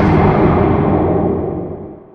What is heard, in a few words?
remnant projectile; void spirit